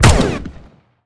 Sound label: Laser